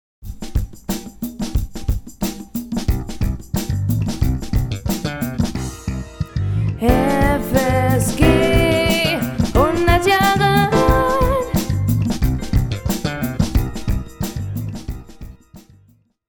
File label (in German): Jingle Projekt; steinhundert